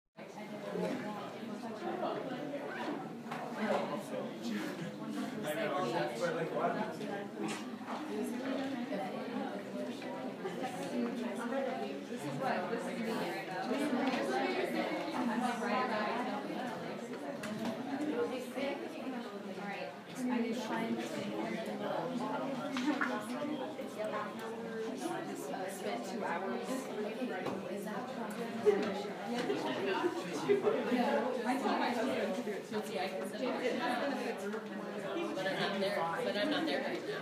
Library Noises

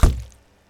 Water Bottle Snare Desk Tom Wobble

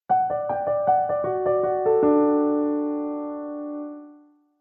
Piano Ident

